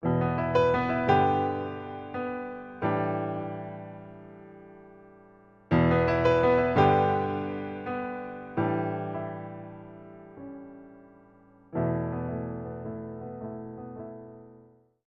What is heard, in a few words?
Introspective Piano Etude